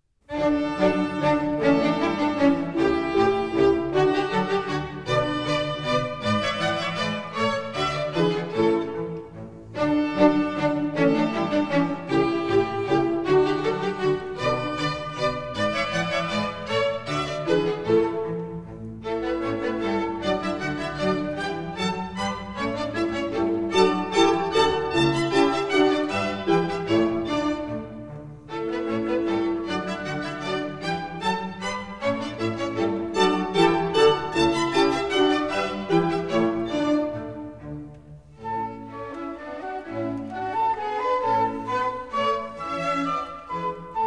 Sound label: Minuets, D Major; 6 Minuets; from Michael Haydn; Mozart; Dances and Marches